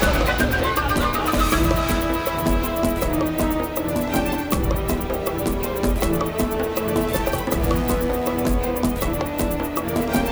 Music Ingame Bgm Lava Loop

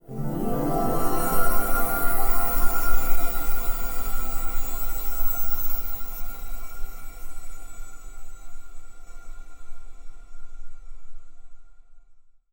Endgame Highscore; the princess and the frog

